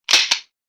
empty mag, weapons

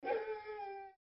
Dog

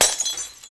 Glass Break; Ed Edd n Eddy; Adromulus Pack; EEnE

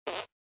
Fart

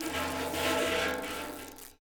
Stretch; Ed Edd n Eddy; Adromulus Pack; EEnE

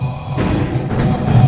Helmet Breathing; 4 kanker attack; Ed Edd n Eddy; Adromulus Pack; EEnE

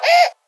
Baby Gasp; Ed Edd n Eddy; Adromulus Pack

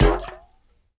Metal Bounce Watery; Ed Edd n Eddy; Adromulus Pack; EEnE

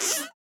Squeak; Ed Edd n Eddy; Adromulus Pack

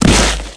Body Impact

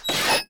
Metal - Opening; Ed Edd n Eddy; Adromulus Pack

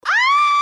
Woman Scream

